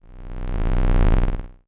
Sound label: Science Fiction Sci-Fi Electronic Energy Warble